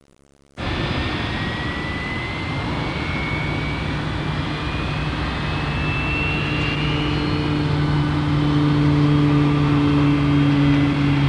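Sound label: takeoff, airports; ambient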